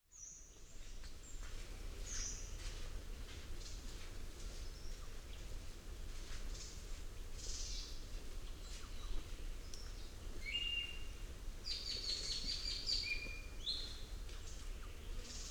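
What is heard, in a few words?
island Forest Quiet; AmbienceGenya; thilandForestQuiet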